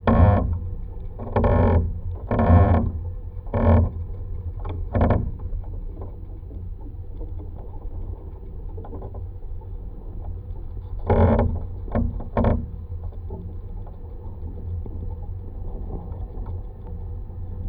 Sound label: windmill front pillar contact mic; Red Libraries